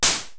DF-500 HiHat, Drumfire

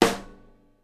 SNARE, Live Snares

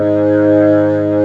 B3 G#1, organ; AKAI S-Series CD-ROM Sound Library VOL-1

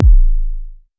Bassdrum

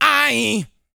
GRUNT, MALE HUMAN; ILIO; Vocal Planet

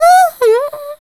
VOICE FX, Dance Vocals

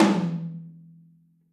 Drums Percussion, Kit1 Room Mic

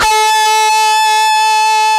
GUITAR, POWER GT

